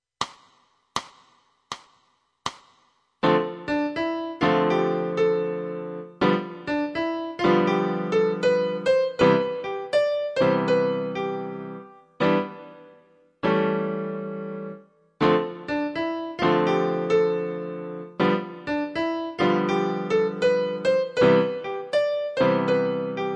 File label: piano